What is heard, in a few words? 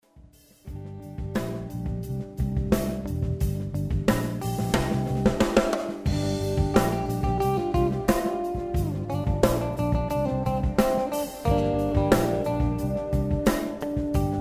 Dub; MoodDocs